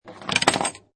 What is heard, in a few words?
Pinball Sound Ball Roll And Passing Object